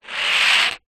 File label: bottle top metal slide on table; foley